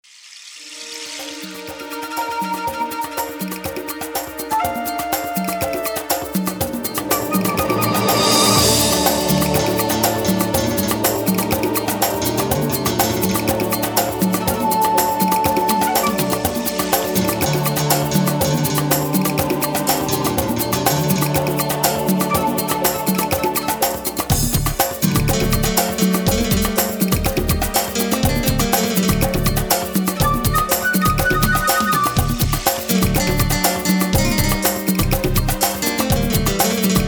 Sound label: Jungle Salsa; Silent Thunder